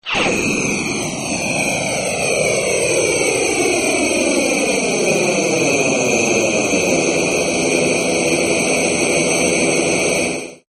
rocket

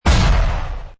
game play; toystory; toystorymania

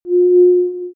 glow rollover

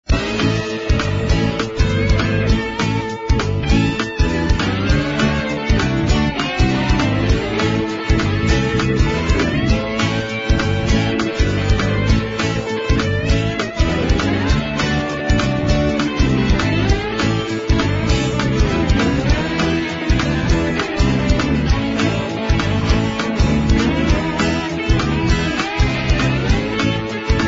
music; car stop and go

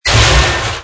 Block Drop; disney; big crane game

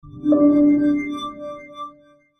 modern alert

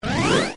shield; snow white

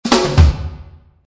drums; camp rock back to school

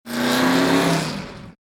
Rev; cars; Dale Earnhart

